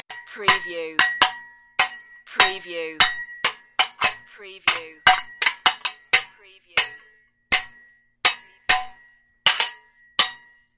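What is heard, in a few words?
Toy Sword Play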